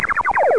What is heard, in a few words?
digital drop, electronic sounds